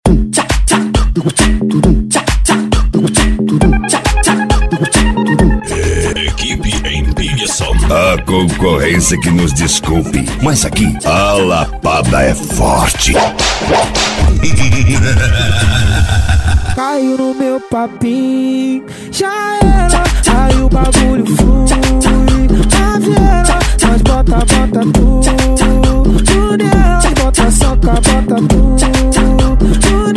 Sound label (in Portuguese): electro funk